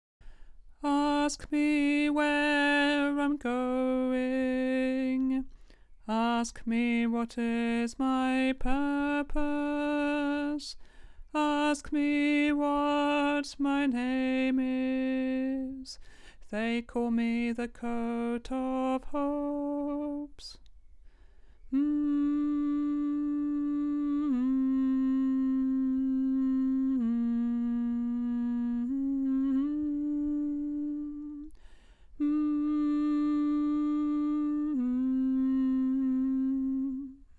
bass

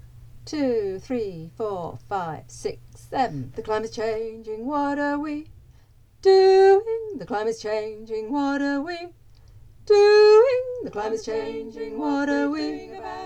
TENOR

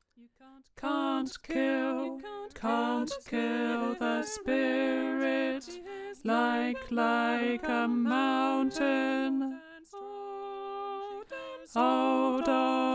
Polyphony voice

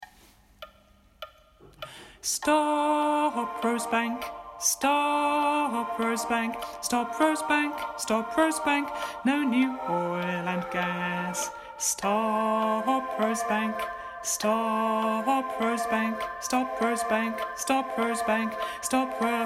TENOR

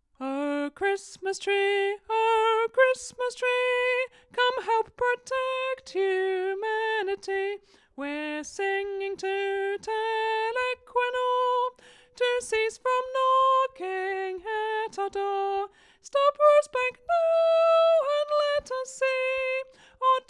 Soprano

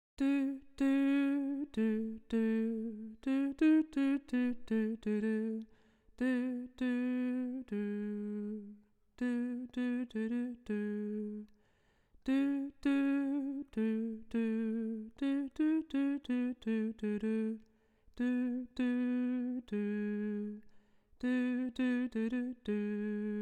tenor

